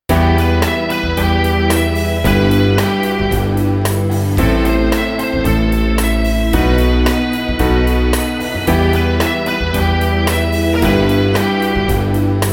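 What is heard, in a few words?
Rock Ballad Classic Theme with Synth Pad in Em 112 bpm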